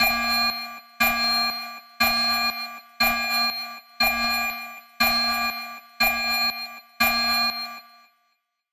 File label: Future Alarm